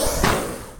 Lizard Shoot; Risk of Rain

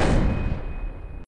Boss Shoot; Risk of Rain

